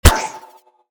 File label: prop spawn; dlfortwars